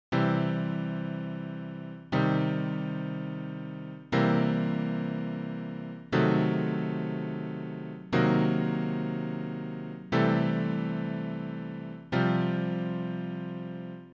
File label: chord C